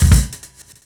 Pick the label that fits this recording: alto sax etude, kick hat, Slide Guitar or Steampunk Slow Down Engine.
kick hat